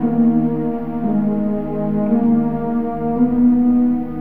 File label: strings tense; Loops; Sad